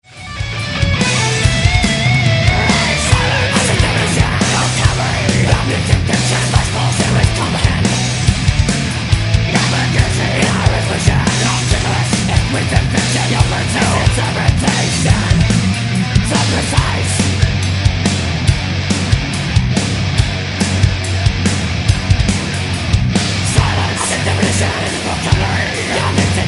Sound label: death metal